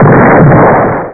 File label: boom; sc2